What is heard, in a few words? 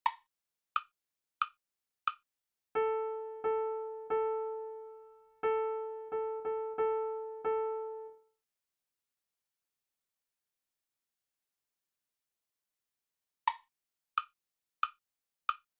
rhythm dictation